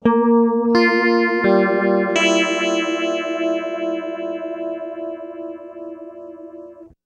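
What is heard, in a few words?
clean guitar stereo polyphase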